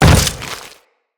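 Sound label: footstep on goo, enemies; Footsteps; Grineer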